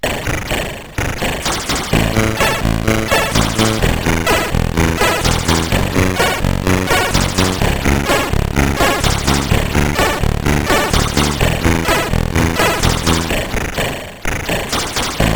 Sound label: Rap, CSG8580R5; Source SoftRunner Group